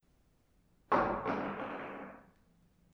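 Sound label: supun, spoon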